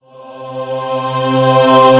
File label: intro Giant Hand Appear; todee and todpee